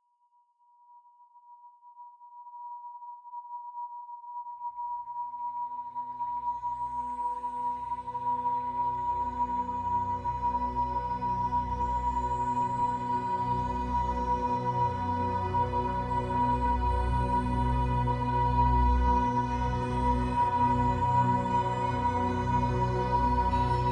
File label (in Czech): Solfeggio frekvence 963 Hz; Korunni cakra